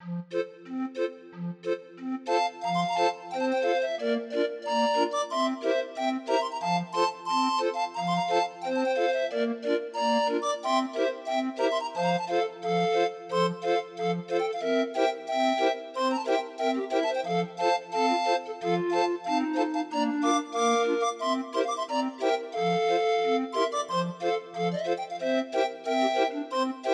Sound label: Blue Tailed Fly, Grind Organ